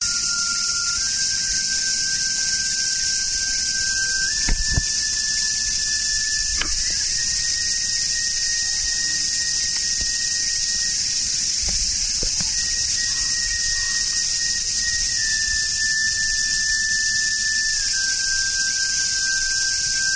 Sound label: Shakuhachi Flute And Cicada